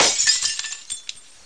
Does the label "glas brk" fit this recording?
Yes